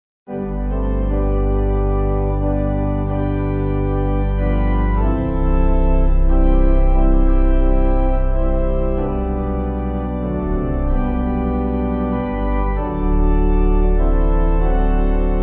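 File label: Pipe, hymn backing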